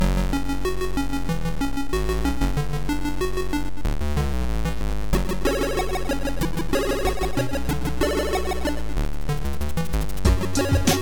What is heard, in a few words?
PSG Digidrums Samples 50Hz 94bpm